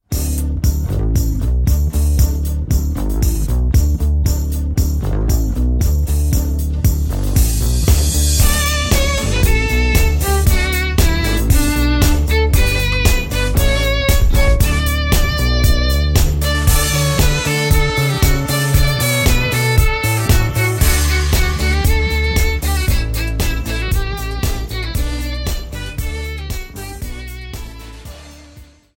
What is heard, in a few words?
Instrumental; Joy to the World